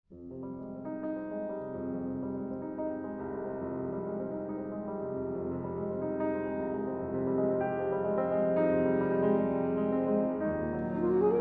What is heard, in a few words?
reverie sax